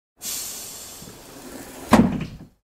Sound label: school bus